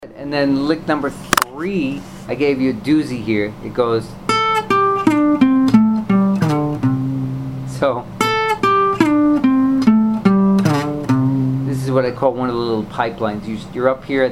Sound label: licks using slides